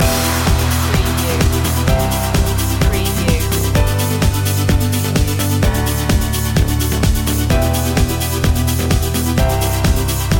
surf loop

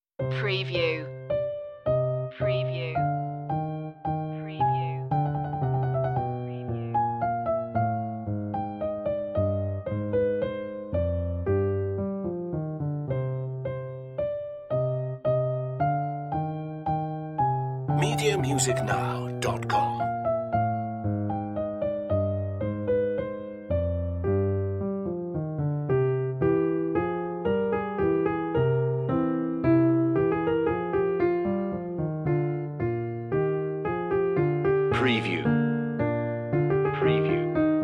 bach menuet c minor; notebooks annamagdalena bach; bwv anh121

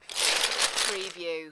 Lego, grab several lego pieces

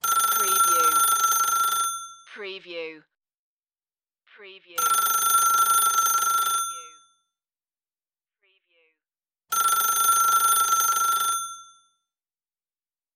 Telephone Rotary Ring; Sounds of ringing telephones, cell phones, mobiles ringing.

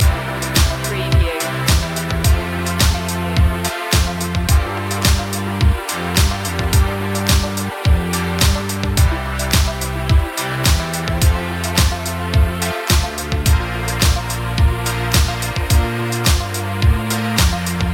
synthwave feeling loop

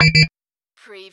Servo Panel Sound - Keypad

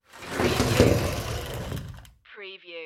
sliding door

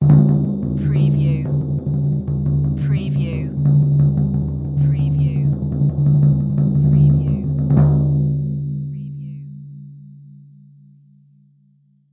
Cartoon Kick Drum Drum Roll

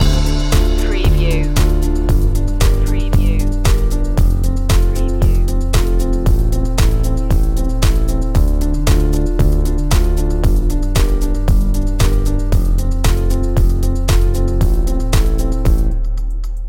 corporate awareness loop

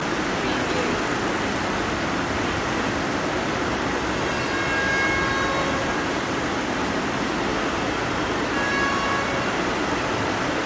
city noise ambience loop

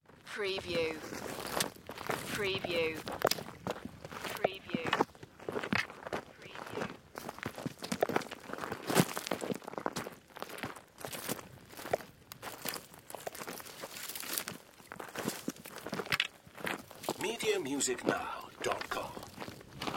Footsteps on Rocks

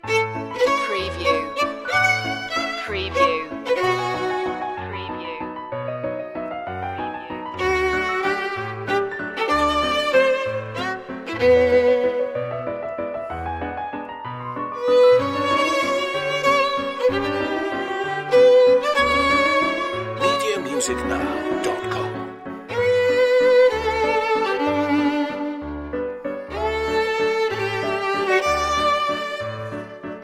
waltz loop

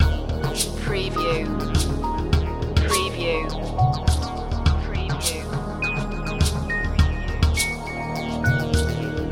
mountain fog loop

